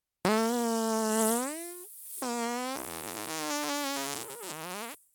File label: fart; vegan